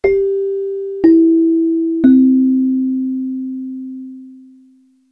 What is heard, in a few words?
Sound Echo 3 klang celesta